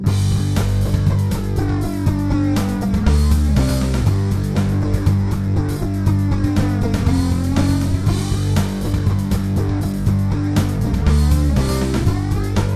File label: advert